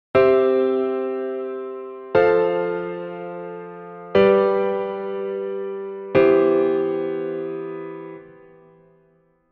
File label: Em Clash